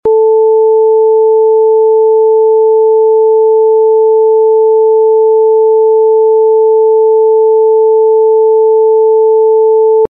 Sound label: Sine 440Hz full scale